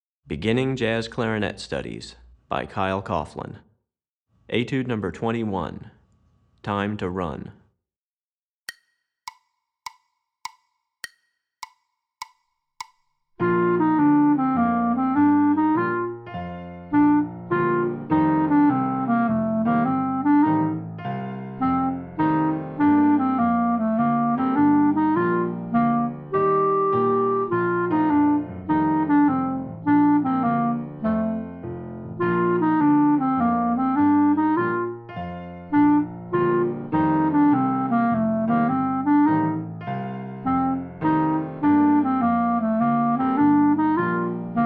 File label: Etude, Jazz Clarinet; Recordings For Beginning Jazz Clarinet Studies, Volume 2; Etude #21; Running Eight Notes